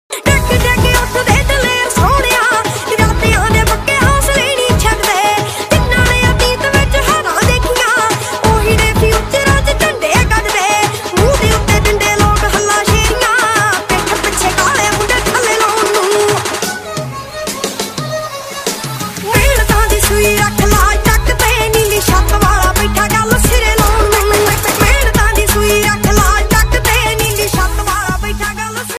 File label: punjabi ringtone